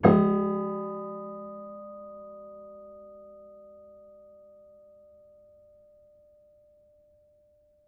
Piano Harmonics

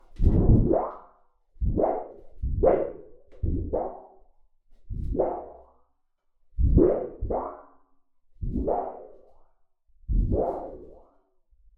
Storage Heater

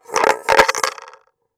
Coin On Collander

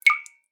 Drips

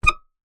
Melodica Short Note

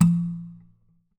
Classical Guitar Extended Technique Plink

